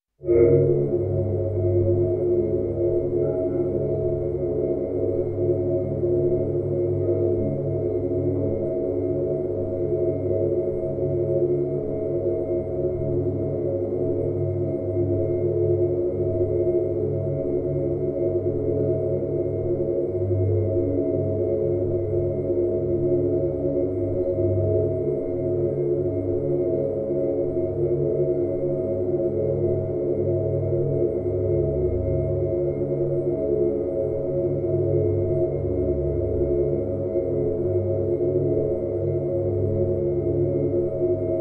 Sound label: Hotel corridor with thunderstorm